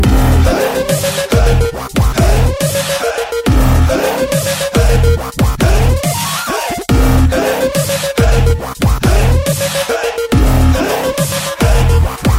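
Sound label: background Tone Telugu Ringtone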